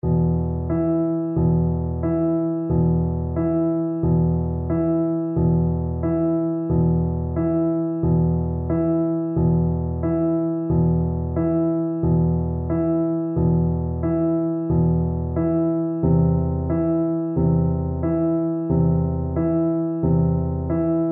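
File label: romanian folkdance VLC karaoke, Cello; bartok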